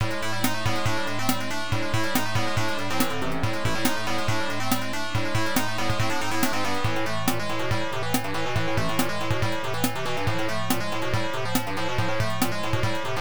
Metal Creature; hydefos hyper defending force system msx2 opll

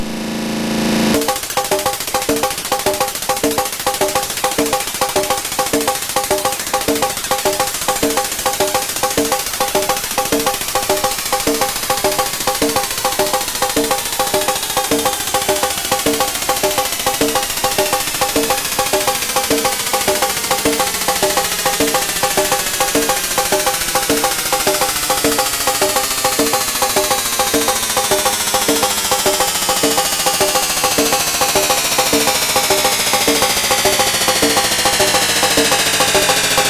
Drums; fish tales williams pinball

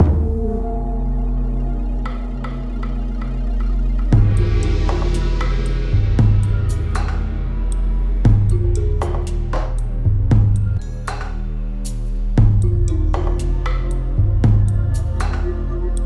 Title Ambient

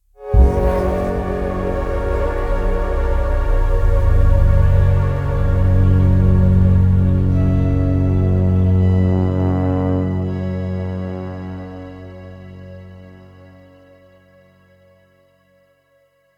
intro cliff

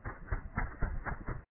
deep footsteps stereo; Five Nights at Freddy's; FNAF 4

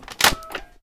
Button Radio; angry birds

